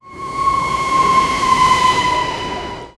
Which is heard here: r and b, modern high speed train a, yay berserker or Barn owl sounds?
modern high speed train a